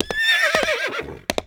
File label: flinch; Rust